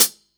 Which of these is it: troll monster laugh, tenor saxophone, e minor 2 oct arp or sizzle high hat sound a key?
sizzle high hat sound a key